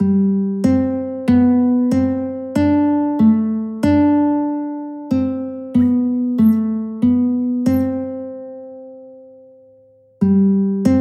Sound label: guitar